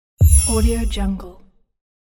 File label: magical spell sound; infinity-sfx-productions